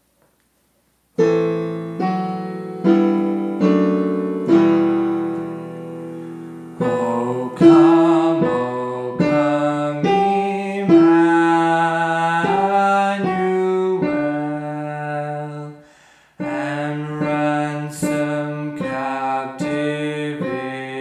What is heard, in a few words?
Alto